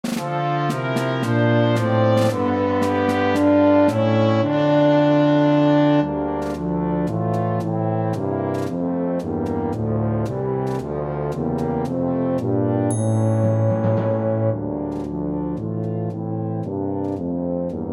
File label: Tuba Track with Melody; God Rest Ye